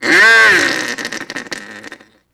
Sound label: Bronx Cheer; Vintage Cartoon; Comedy Vocals